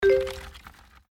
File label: hit